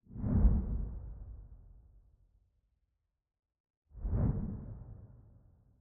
Pipe Enter First Time

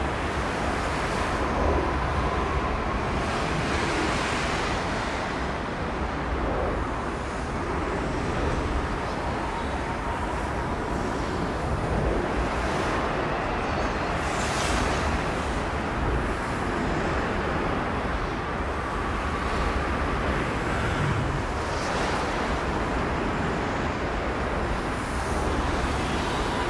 AMB; DEMACIA

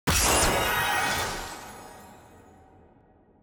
ui button click; lillia; spirit blossom

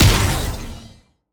Attack Jump; bloom minigame

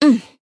Nonverbal Informative; Aurora

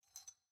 Foley Ponytail

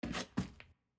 Footstep Dirt Rotate

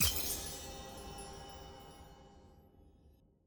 ui choice click; spirit blossom

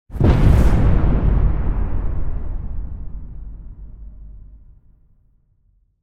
Cutscene Intro; bloom minigame

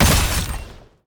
Attack Dash; bloom minigame

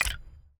UI Click Enter; bloom minigame

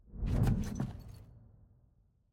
Monkey Exit; Puzzle

